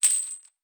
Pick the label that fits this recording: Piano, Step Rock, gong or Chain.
Chain